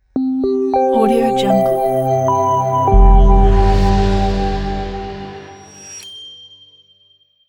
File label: smooth move logo